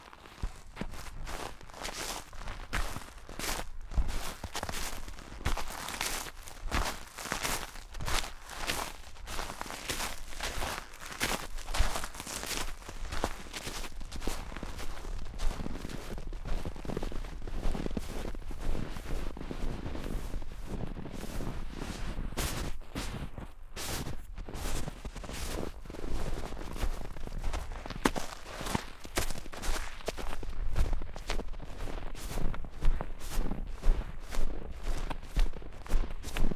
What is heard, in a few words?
footsteps snow